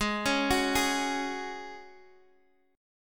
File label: F sharp Suspended 2nd G sharp